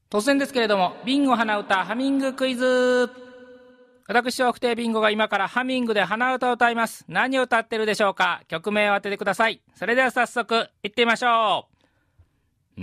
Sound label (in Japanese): hanauta